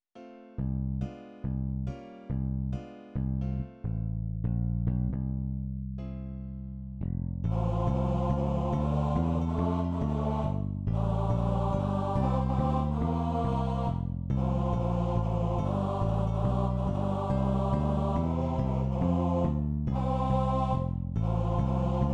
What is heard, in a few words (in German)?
Bass